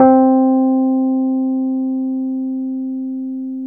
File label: RHODES EP2 C3; Sonic Reality; Vintage Timetraveler